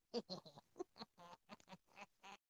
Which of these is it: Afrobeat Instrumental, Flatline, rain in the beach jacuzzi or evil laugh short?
evil laugh short